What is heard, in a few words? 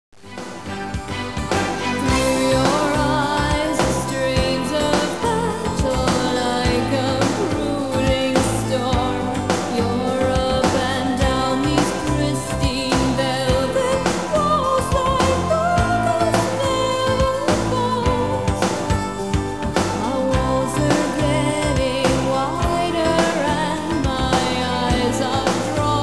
Vox; disco